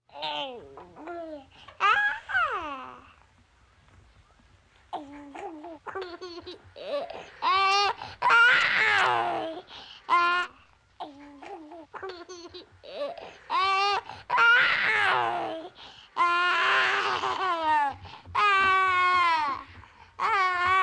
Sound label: Baby Laughing